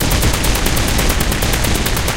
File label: loop oerlikon kda 35mm, guns tanks